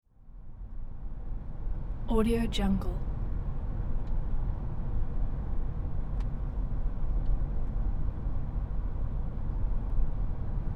car ambience

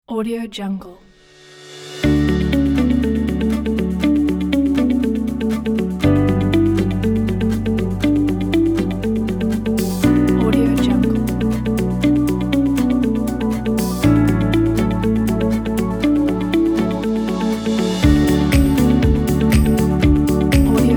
success presentation advertising corporate

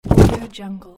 body fall; danielmix

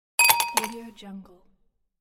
ice in a glass; soundmakefx

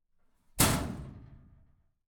steel lockers open close full length

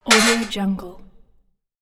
shield

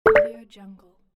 message notification

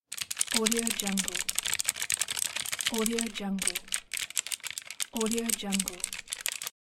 quickly typing on mechanical keyboard

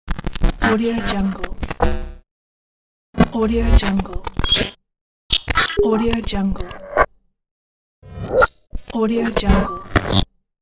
glitch digital interference

